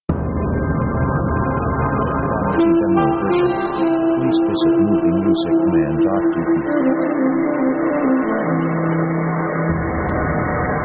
dark city panorama WITH SAXOPHONE; synth themes

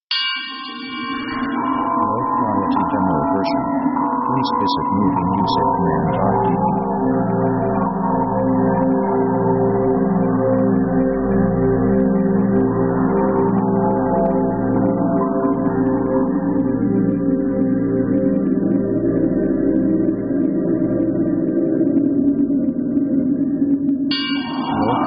awaiting

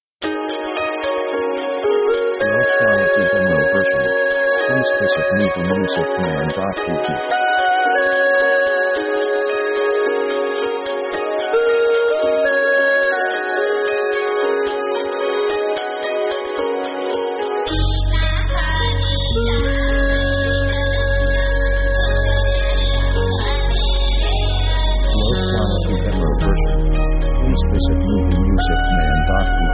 pop far east

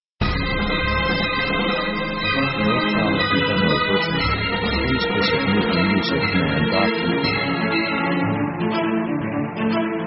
orc action

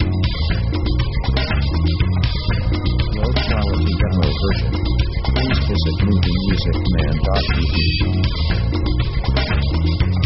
african fis dur

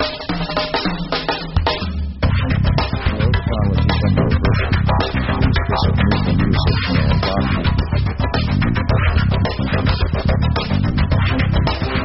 atmosphere dreamy to harsh; power themes

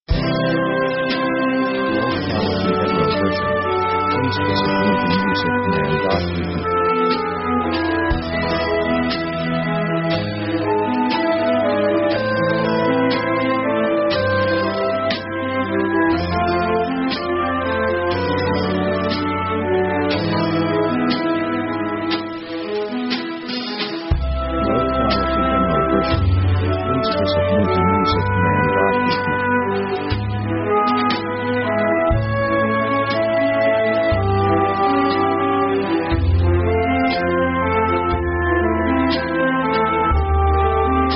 orc traditional classic; orchestra themes

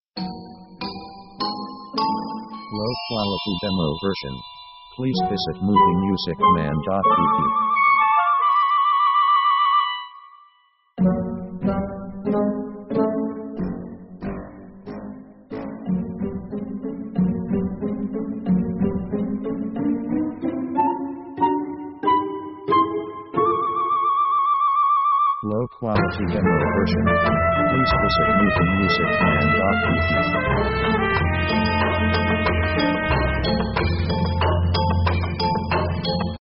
orc comedy

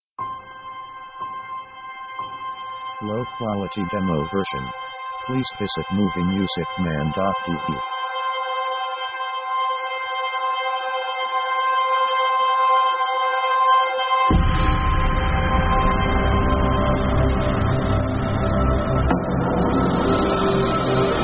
big wide atmosphere; synth themes

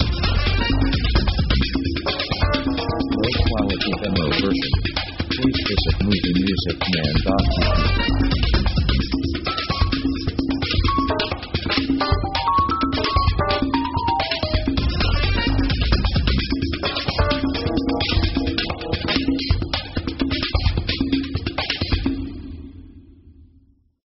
funkyjazz

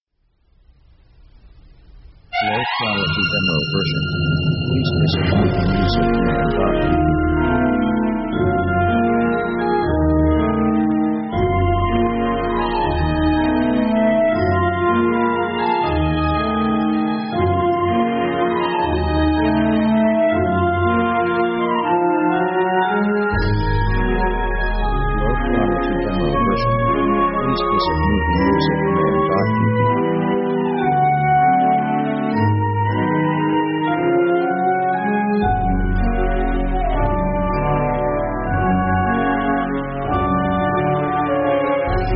belle epoque, orchestra

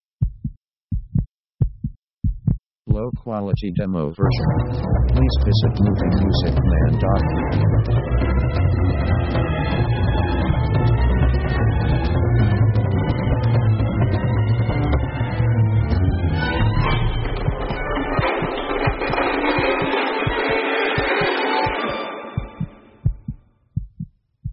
pursue, fast

